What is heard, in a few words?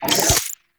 Charge; Weapons; Warframe; Tenno; Wraith Sidearm; Epitaph Prime